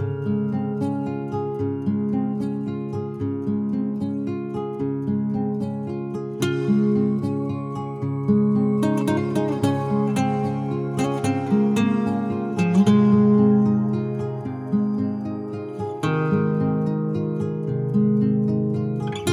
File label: Acoustic Short